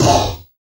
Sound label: FX Dance